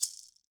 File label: MARACAS; EdgeSounds; Drum Mashines VOL-1